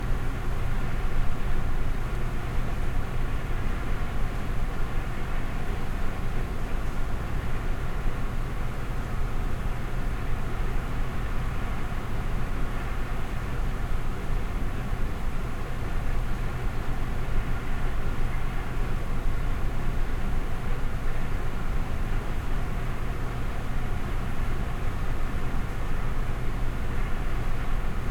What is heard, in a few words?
School, Cafeteria, Ambience; UberDuo; The School Audio Playset